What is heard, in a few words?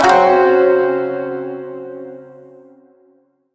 Shawzin Hirajoshi Chord B; Chords; Warframe